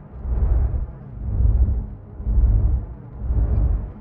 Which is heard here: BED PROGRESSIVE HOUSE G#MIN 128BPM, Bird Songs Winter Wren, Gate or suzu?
Gate